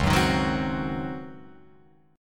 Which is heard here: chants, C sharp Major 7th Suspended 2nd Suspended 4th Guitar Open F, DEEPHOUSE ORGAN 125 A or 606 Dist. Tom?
C sharp Major 7th Suspended 2nd Suspended 4th Guitar Open F